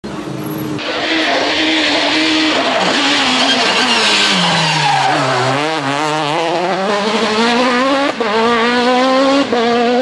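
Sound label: xsara kit car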